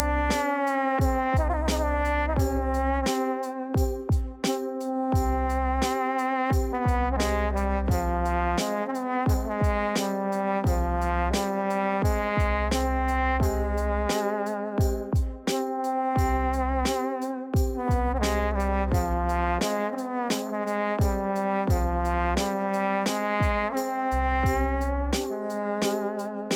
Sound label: duduk; lyria; survival theme